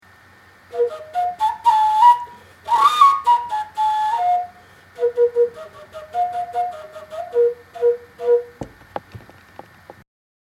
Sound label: koncovka jam